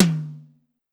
Tom Mid Hi, Drum Machines, Clavia DDrum AT, Pitched Up